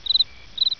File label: exterior